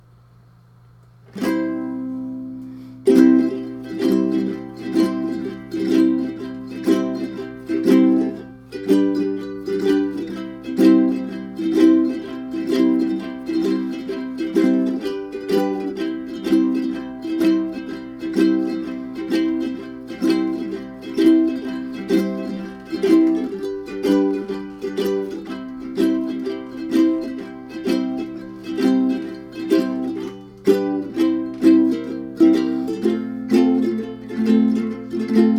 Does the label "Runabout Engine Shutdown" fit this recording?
No